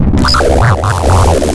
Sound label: DISTO FX; Big Beat Samples & More; AKAI; DISTOFX KIT